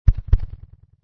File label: heart beat